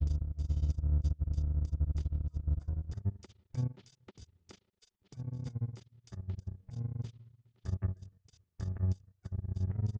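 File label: with drums inst sim; SASSEC07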